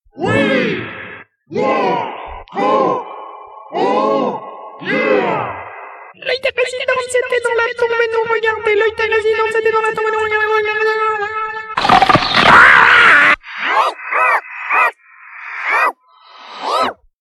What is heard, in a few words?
Jingle